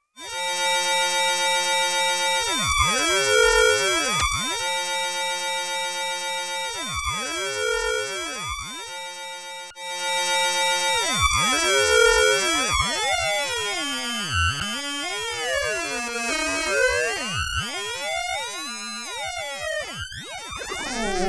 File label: Freq Shift